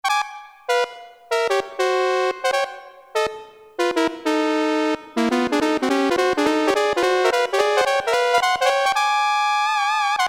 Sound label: HORN TRITONE, Roland Jupiter-8, Factory patches